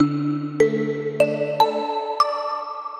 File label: signal, telephony